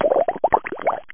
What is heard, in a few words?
bubble; Legendary Design Technologies; beach; moviesounds